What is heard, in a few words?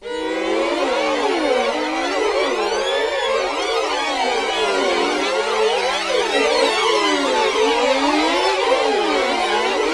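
VLN FAST SLIDE, Violin; Q Up Arts; Denny Jaeger Master Violin Library; PERFORMANCE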